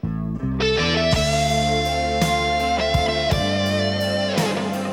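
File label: guitar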